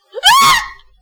Short Scream

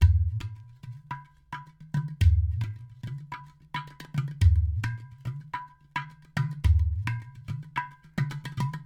Udu stereo loop

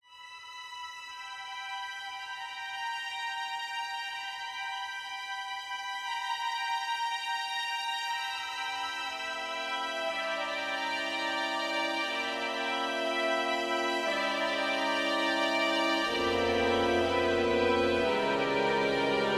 Developing Harmony

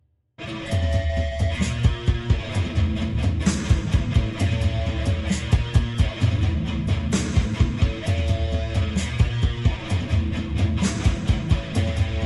Loop Action Fight

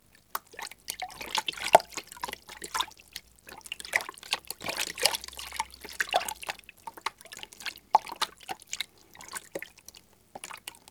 Water Swashing

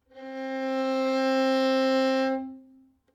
Violin C 5 Tenuto Non Vibrato

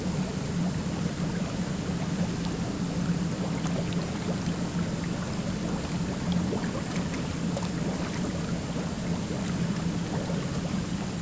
Tank Bubbles Air

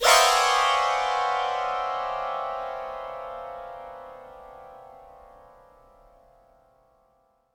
Piano strings strum

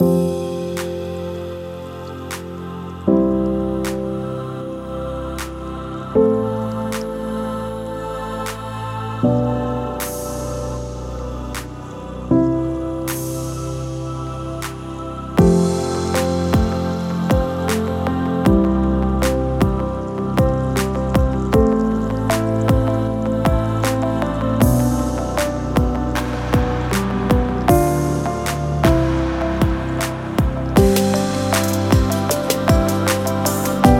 Inspirational Moment Loop

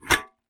Metal Flick Hit

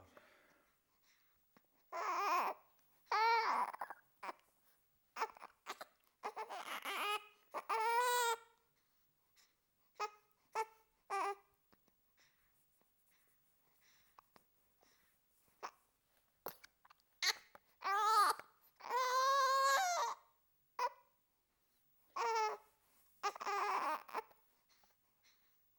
Premature Baby

